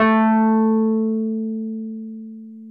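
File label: Wurlitzer electric piano; Sonic Reality; Vintage Timetraveler; WURLITZ EP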